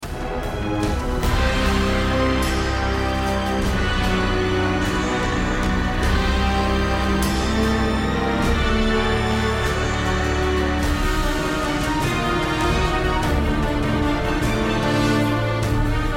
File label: cinematic menace; hero of sparta; iphone